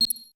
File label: tech oneshot; ambient; Counter-Strike Global Offensive; office